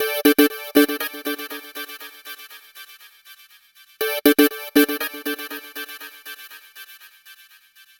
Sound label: Progressive Synth, Trance; Sonic Foundry (Sony Creative Software); Trance NRG VOL-2; Progressive Synths